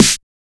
ENHANCE SNR; Drums In Your FACE!

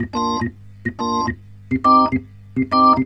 Roots Reggae, B-3; Sounds Good - Roots Reggae

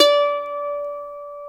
MANDOLIN D5; AKAI S-Series CD-ROM Sound Library VOL-7

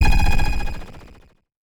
Sci fi

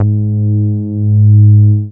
BAS MINI, Mini-Moog

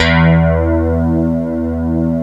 STRAT CH E2, GUITAR; AKAI S-Series CD-ROM Sound Library VOL-8

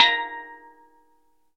STEEL DRUM

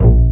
Pizz Basses A#0; Proteus 2000; Digital Sound Factory Proteus 2000

